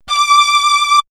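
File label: string, orchestral strings; X-Static Goldmine; backtothe70's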